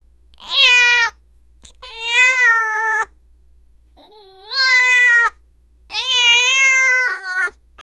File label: cat laughing and smiling